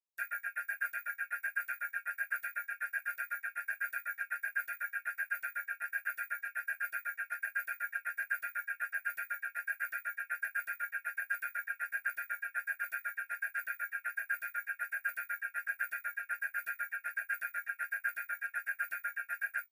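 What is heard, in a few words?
Computer Read Out High Pitch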